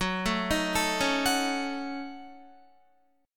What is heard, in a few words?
Gb Minor 6th Gb down Guitar; arpeggiated; JGuitar